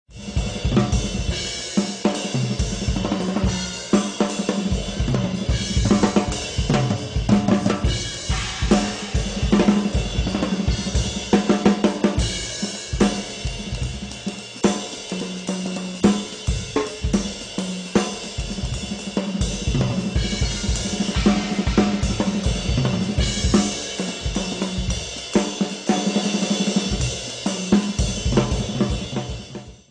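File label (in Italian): batteria, strumento a percussione formato da membranofoni e idiofoni; Sono membranofoni: grancassa, rullante, tom-tom, timpani; sono idiofoni: ride, hi-hat, charleston, crash, splash, china.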